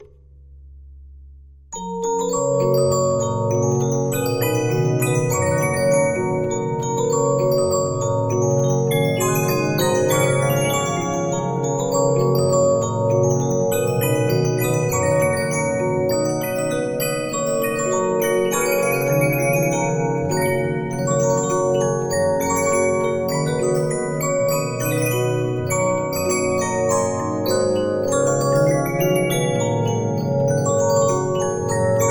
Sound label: Regina, 15inch disc musicbox